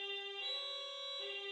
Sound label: Amb Red-Corridor Siren Loop